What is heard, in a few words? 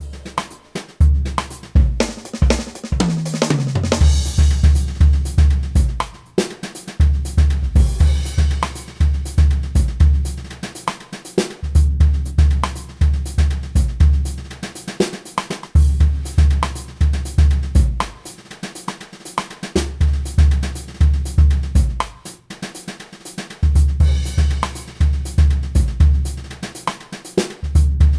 Brushes all over the floor (drums only)